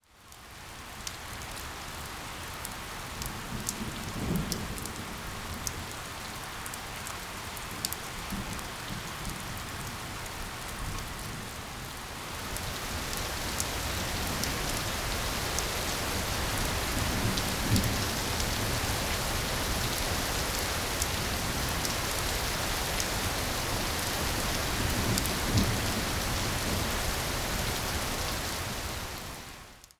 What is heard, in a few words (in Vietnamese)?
Tieng troi mua nhe